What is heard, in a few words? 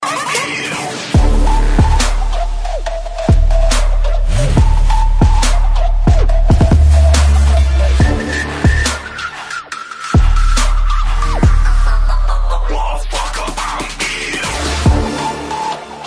best trap, ringtones